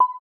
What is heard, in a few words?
decision, button